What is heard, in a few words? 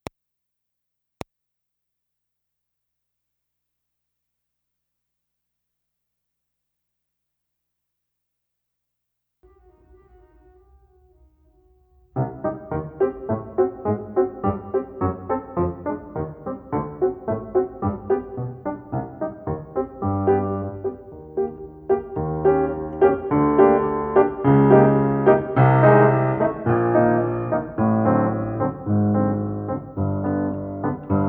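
allegretto tenor sax accompaniment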